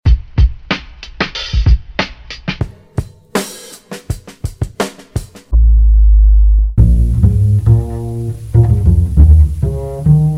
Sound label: Vinal Countdown Samples; Burntablism Volume 1